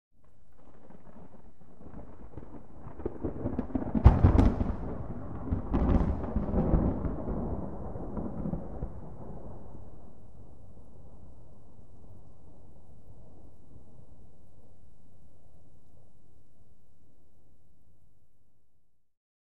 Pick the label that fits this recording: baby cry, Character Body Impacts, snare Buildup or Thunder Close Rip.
Thunder Close Rip